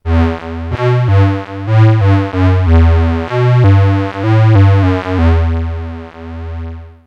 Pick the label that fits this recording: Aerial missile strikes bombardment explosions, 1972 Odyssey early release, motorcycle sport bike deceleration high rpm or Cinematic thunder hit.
1972 Odyssey early release